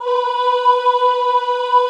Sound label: OHH B3, Classical Choir; Best Service; Extended Classical Choir